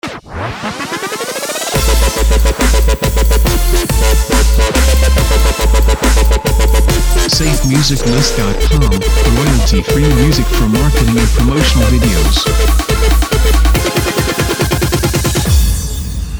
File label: Dubstep Video Intros; SafeMusicList